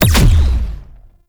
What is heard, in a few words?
Alt Fire Punch; weapons; Warframe; Corpus; Tenet Quanta